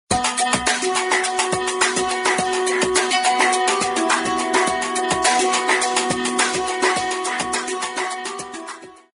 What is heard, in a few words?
galaxy ringtone; explore